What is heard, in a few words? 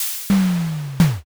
hatch